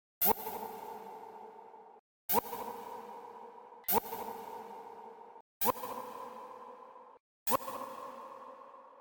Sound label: selection noise; HLD